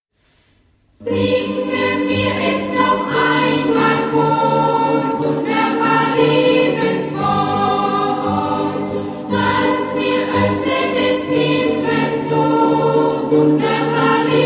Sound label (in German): Gitarrenchor